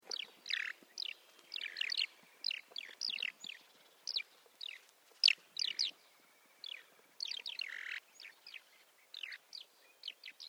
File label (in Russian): PolZavoronok Pozivka; ornitologiya